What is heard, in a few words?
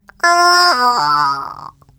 turret squashed; npc; Portal 2